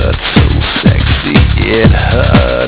sexy

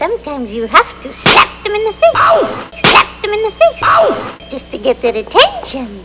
slap

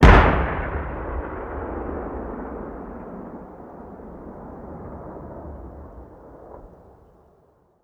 soundscape boom

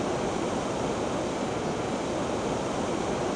loop propane

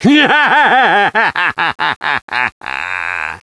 laugh

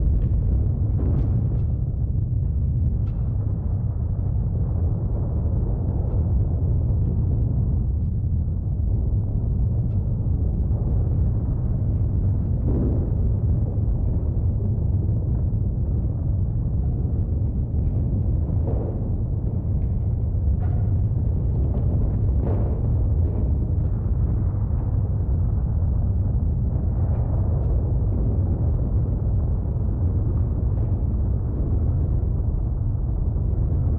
ambience large

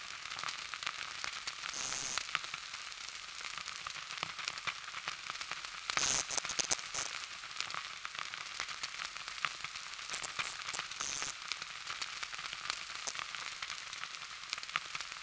ambience disaster electric

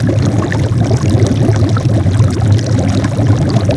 bubble loop

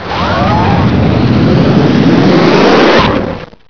energy draw, weapons

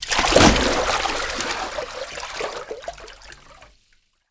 water splash

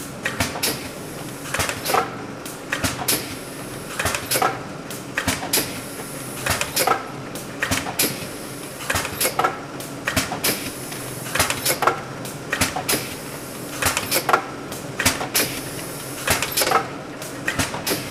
ambience

